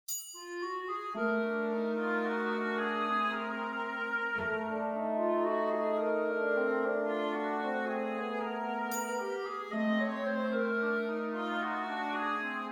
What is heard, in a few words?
Five Bells